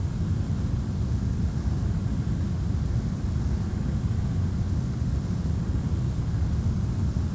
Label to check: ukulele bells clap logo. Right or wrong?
Wrong